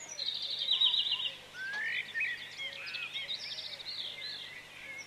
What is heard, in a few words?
birds